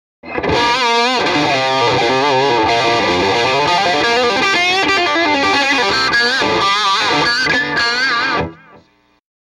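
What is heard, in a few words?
m75 lp jtm45 straight in bridge pickup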